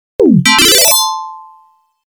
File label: insert coin